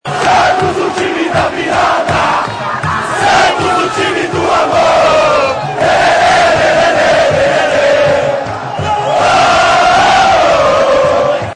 gritos da torcida do santos; santo o time da virada

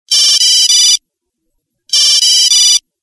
toque de celular antigo

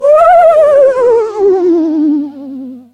fantasma barulho efeito sonoro

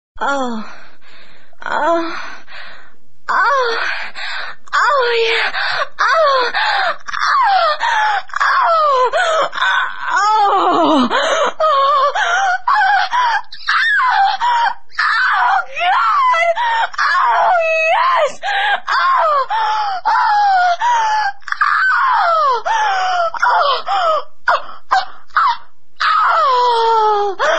gemidos, som de gemido de mulher; toques para celular

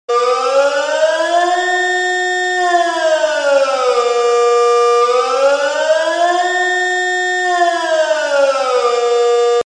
sinal de alerta

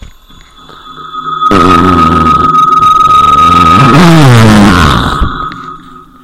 Peido com eco, toque para celular peido espacial

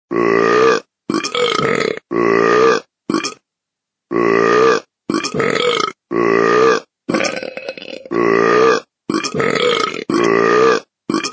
rap do arroto

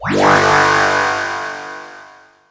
alerta espiao efeito sonoro

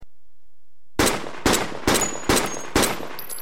tiros de pistola 41; pistola m41

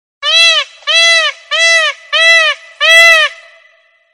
ave - sons de animais

